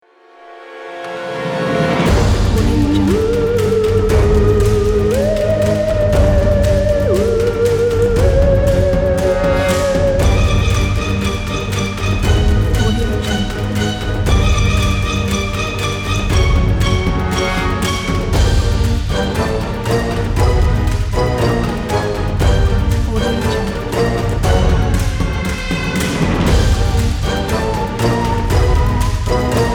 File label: halloween night